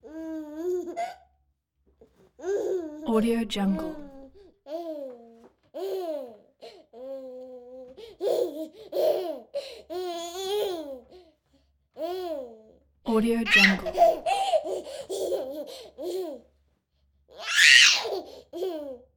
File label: baby 10 months laugh; human sounds; خنده ی بچه